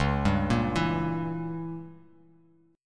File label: C sharp Diminished 7th C sharp down Bass Standard; C#dim7 arpeggiated; JGuitar